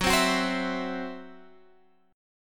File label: E Suspended 2nd Sharp 5th F sharp